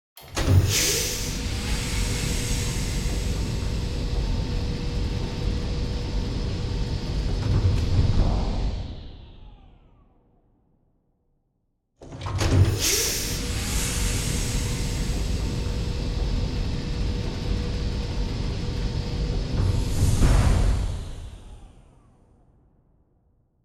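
Sci-fi door Large Gateway With Lifting Flap With Pneumatics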